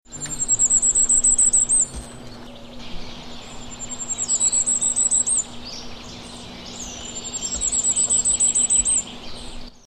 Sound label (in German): sommergoldhaehnchen